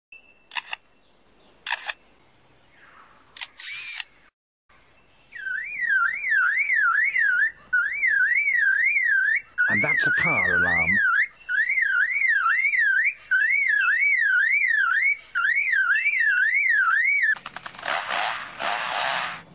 Menura, پرنده

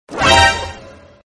Sudden Shock Sound

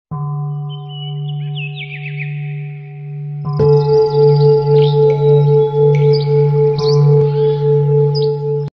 Japanese meditation; مدیتیشن ژاپنی

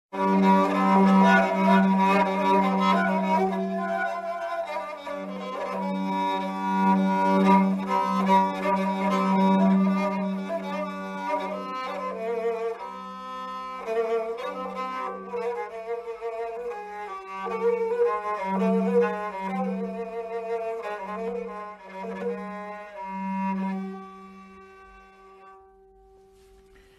sorahi

